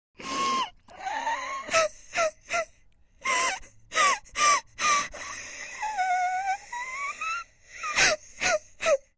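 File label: woman crying sobbing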